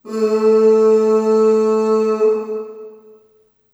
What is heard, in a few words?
Ooh Short A2 Short Reverb; Harmonic Building Blocks